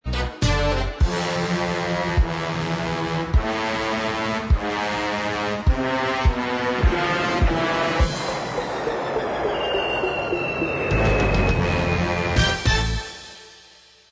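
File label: theme instrumental track, backing tracks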